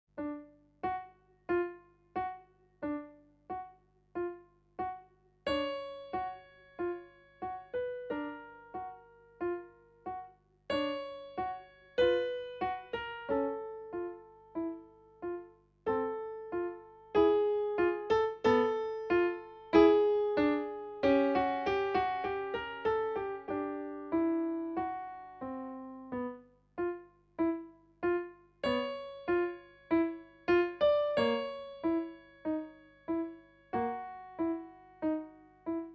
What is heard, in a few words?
Click Tock, Piano